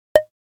Button Pop - Comedy; Hollywood Edge; sound designer's toolkit v2

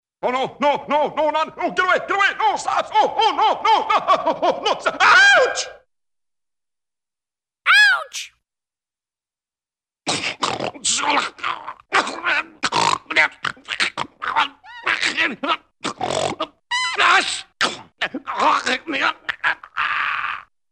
Funny Man Being Attacked Tortured Yells; Cartoon; Hollywood Edge; Cartoon Trax

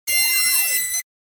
Alert Beep Sci-Fi; Hollywood Edge; sound designer's toolkit v2

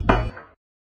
Shield; Blind Quest - The Frost Demon

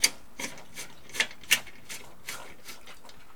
Blinds Window Twist; SFX Kit; Foley - Misc

